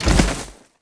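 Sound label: droid body fall large dirt; droids robots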